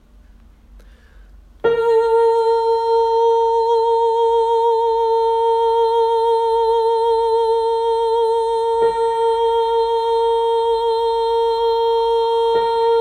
tenor; åfjord

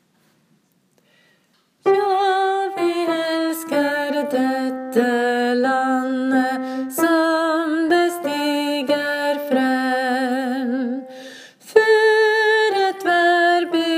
ja vi elsker alt; åfjord

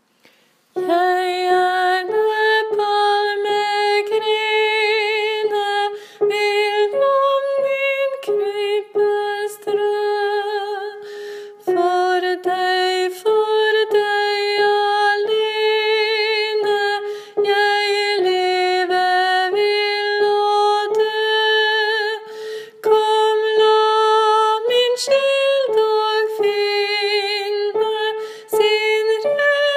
mitt hjerte tenor; åfjord

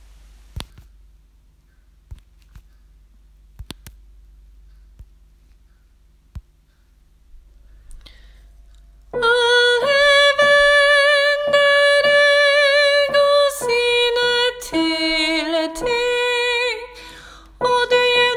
tenor; alle-vender-augo; åfjord

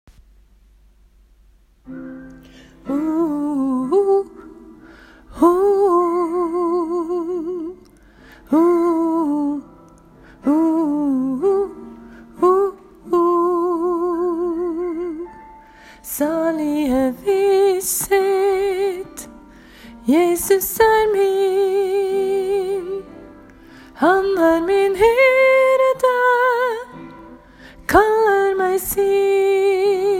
sopran; salige visshet del 1; åfjord

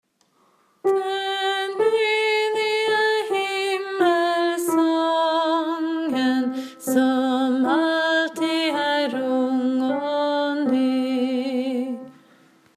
det lyser 4. vers alt; åfjord

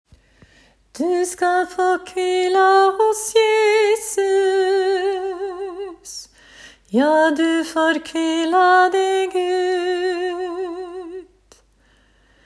du skal fa kvila hos jesus sopran; åfjord

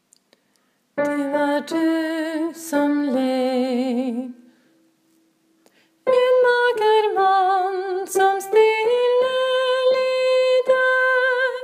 tenor; åfjord

